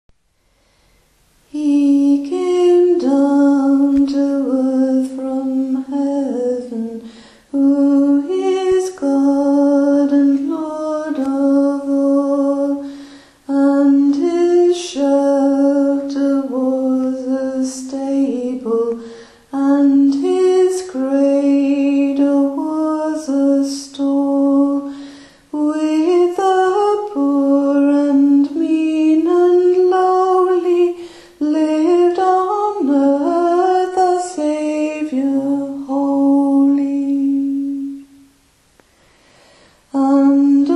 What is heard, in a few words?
alto voice; once in royal davids city